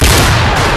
Barret 50cal; Mw3

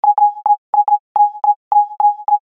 Morse

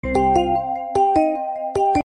Melodious Alert

